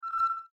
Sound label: beep